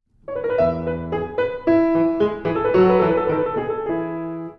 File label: cadences